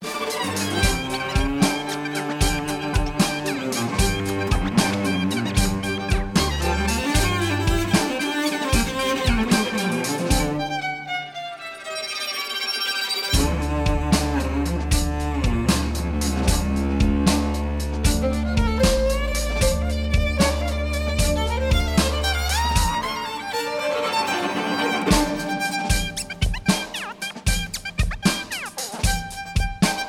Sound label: String Quartet